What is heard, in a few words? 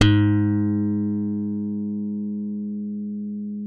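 left thumb G#2, slap thumb; Xsample VOL-1; 90 s Sample CDs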